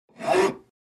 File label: Hand Saw One Stroke